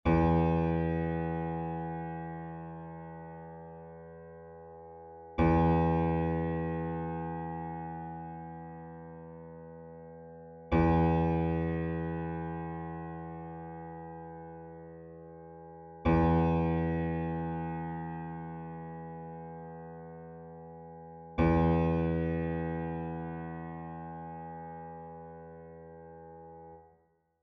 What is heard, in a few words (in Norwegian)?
stemmetoner for gitar e2 strengen